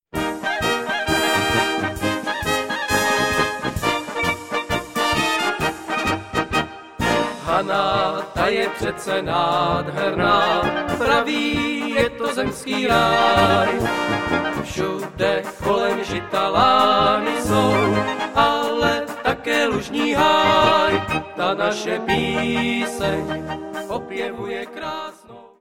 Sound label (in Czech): Polka